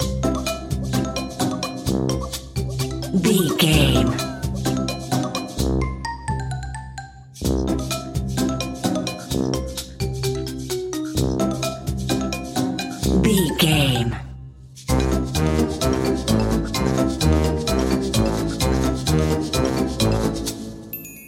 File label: wacky inventor